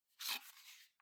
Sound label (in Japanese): リモコンを取る音